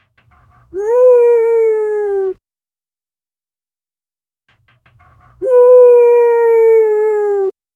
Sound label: meow meow but by dog; pet